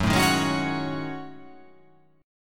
F sharp Minor 6th Add 9th

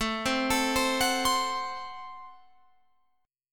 A Minor 6th A Guitar Open F; 21,19,21,19,21,19; arpeggiated; Am6

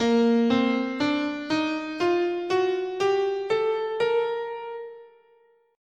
A sharp Bebop Major, scale

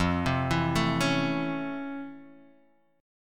F Major 7th Flat 6th, F, guitar

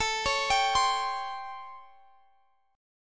A 7th Sharp 9th, Guitar, Half Step Down

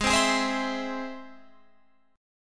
G sharp Augmented G sharp Guitar Open F; G#+ strummed; 20,19,20,19,19,19; JGuitar

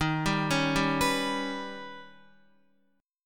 Eb Augmented 7th Eb, Guitar; arpeggiated; x,6,7,6,7,7; 4F,4A,5C,5G,5C,6E

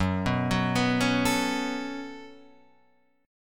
B 7th Suspended 2nd, Gb

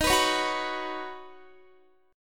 Eb Minor 7th Sharp 5th Eb Guitar; Ebm7#5 strummed

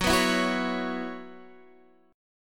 F sharp 7th Suspended 4th, Guitar, Open D

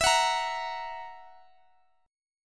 E Suspended 2nd Flat 5th E

